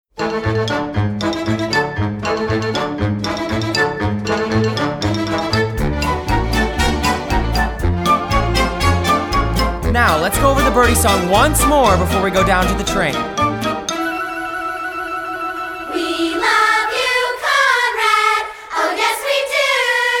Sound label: Vocal Guide